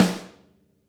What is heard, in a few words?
SNARE, Interactive Drum Kits; Sonic Reality; ID SNARE 1+